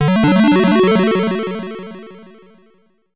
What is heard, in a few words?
Get Ready! SID Stereo; Gem X; Commodore 64